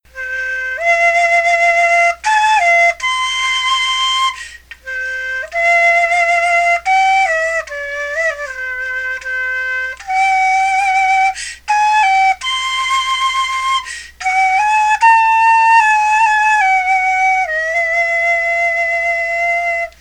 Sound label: flute